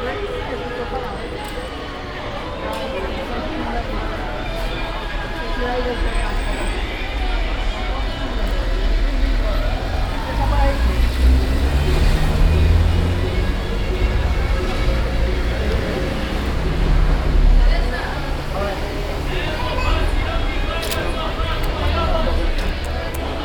aufm markt, Brasilien; Fieldrecordings

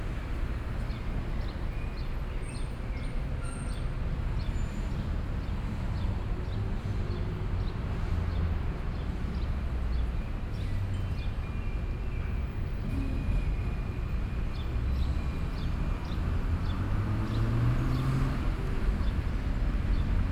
auf der strasse, Brasilien; Fieldrecordings

